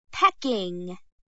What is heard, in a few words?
pecking